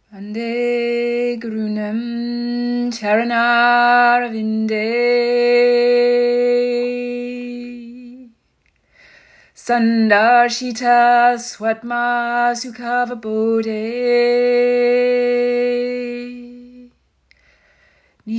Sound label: Ashtanga Opening Mantra